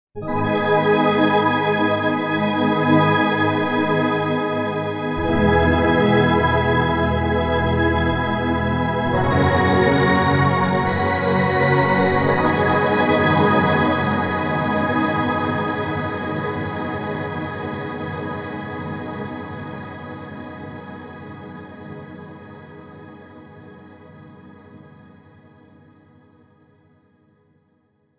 Light Org, yamaha sy77 sy99 pads

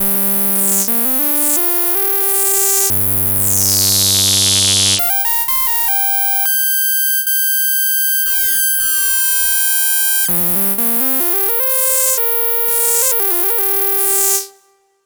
harsh feedback; Diva

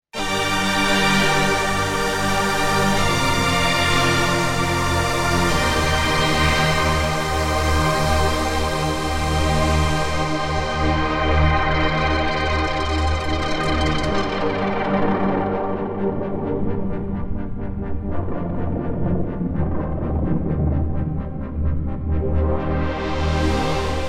SY77 PAD; sy77 pads; yamaha sy77 sy99

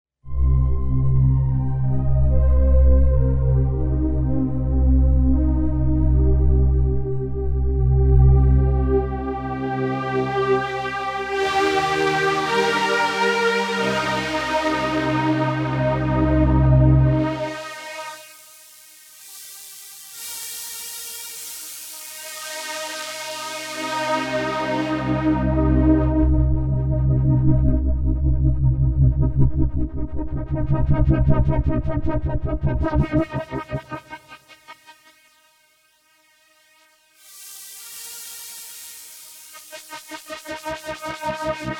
Yamaha SY77, Saturn Pad; sy77 pads

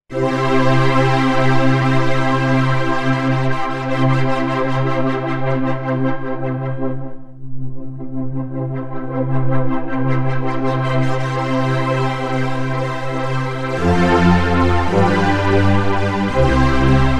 Yamaha SY77, Galant Pad; sy77 pads

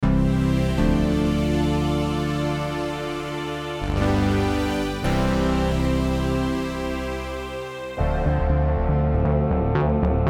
Air String; Rhino Electro Funk

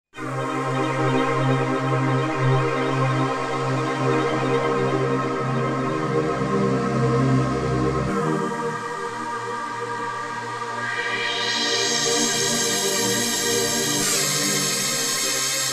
Sweep Star, pads, yamaha sy77 sy99

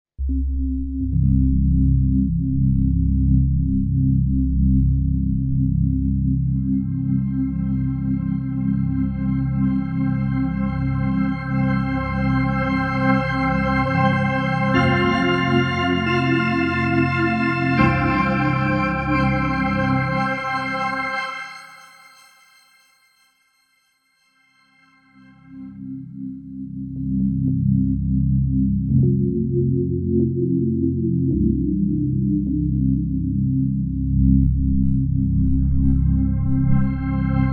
Ambient SIN, yamaha sy77 pads

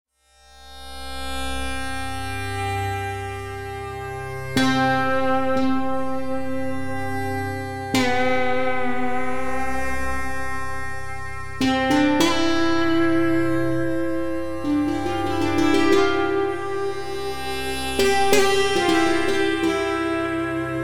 Eastern Vibe

